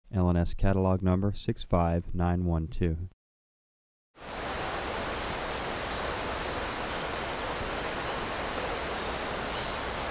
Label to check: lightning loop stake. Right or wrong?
Wrong